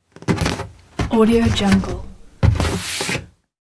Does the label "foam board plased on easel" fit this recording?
Yes